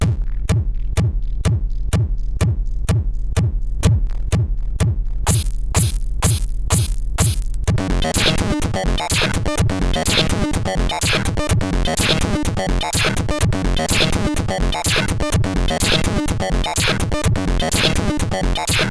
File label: sid CSG8580